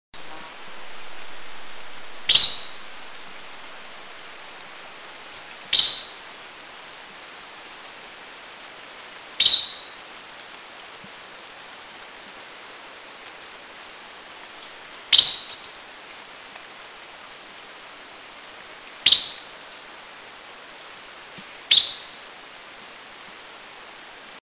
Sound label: Yellow-billed Blue Magpie; Eaglenest; India